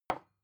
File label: glass put down; objekte